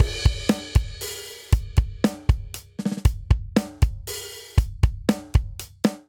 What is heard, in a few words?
Straight Three Rock Verse Variant 3_4 118 bpm; MIDI Clips; Drum; Ableton; Factory Packs; Drum Booth